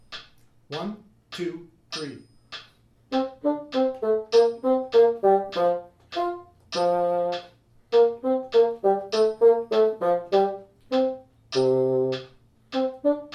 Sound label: Gavotte Bassoon 2 100